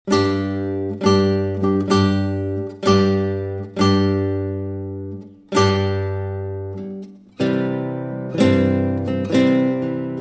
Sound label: Bridal Chorus